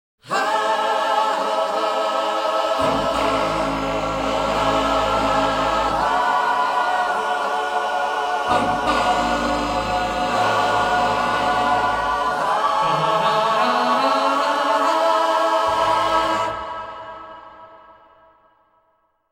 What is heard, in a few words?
Fanfare, Vocal Stylings; Majesty; Network Production Music 082 Vocal Stylings (Specialty)